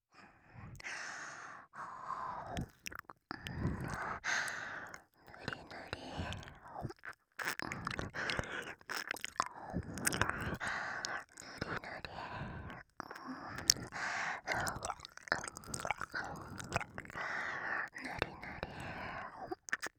耳舐め ぬりぬり 左

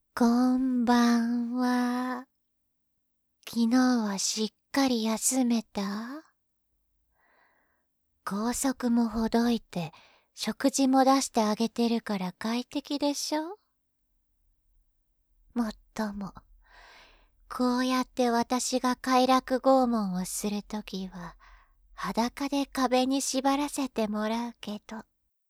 焦らし全身舐め; 女幹部

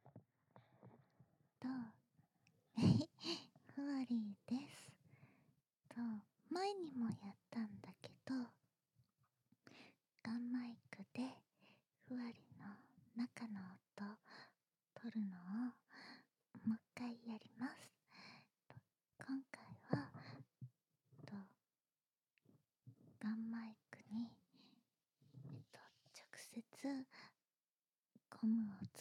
ガンマイクで 膣内の音

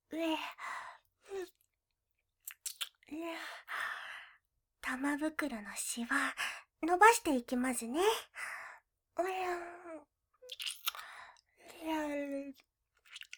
玉舐め; ダミーヘッドバイノーラル; VOICE LOVER

